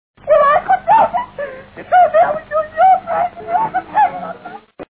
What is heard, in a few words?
cry